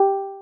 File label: stream user joined